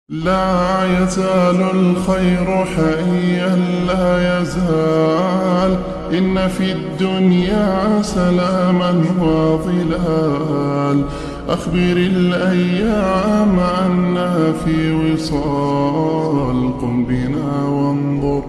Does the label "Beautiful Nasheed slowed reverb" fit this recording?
Yes